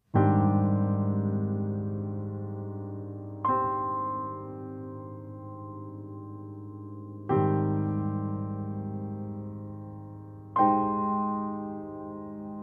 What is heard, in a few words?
AbMaj7